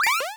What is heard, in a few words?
MOVE; C64 Dreams; Multi-Sound Default